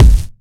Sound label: clean kickdrum sample g key